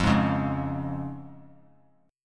F Minor Sharp 5th, chord; 17,15,16,18; Fm#5 strummed; JGuitar

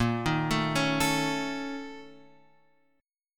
A sharp Minor Major 7th Double Flat 5th

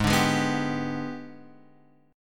Ab Minor Major 7th Flat 5th, down; AbmM7b5; strummed

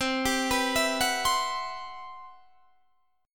C-Major Sharp 11th, Guitar DADGAD

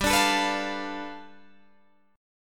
G 9th, Guitar Open D

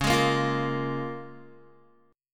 Db 6th, Guitar; strummed; tuning=4E,4A,5D,5G,5C,6F; 9,11,8,10,10,x

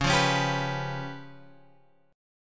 C sharp 7th Flat 5th C sharp down Guitar Open G

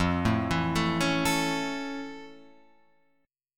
F Augmented Major 7th F Guitar Open F; F+M7; arpeggiated

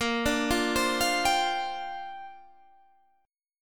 Bb 6th Add 9th Bb Guitar Standard; Bb6add9 arpeggiated

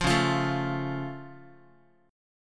C sharp Augmented Guitar; strummed; 4A,4E,5A,5D,5G#,6C#; 8,9,8,7,x,x; JGuitar

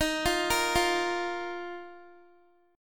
D sharp Suspended 2nd, Guitar; D#sus2; arpeggiated; x,18,17,15,17,x

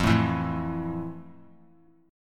F Major 7th F; strummed; JGuitar

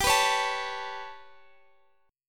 B 7th Suspended 2nd Sharp 5th, chord

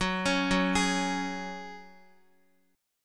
F sharp Suspended 2nd, Guitar; arpeggiated; JGuitar

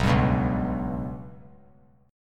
B Suspended 2nd Sharp 5th; Bsus2#5 strummed; JGuitar

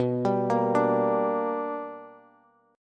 B Minor Major 7th Sharp 5th Bass; arpeggiated; JGuitar

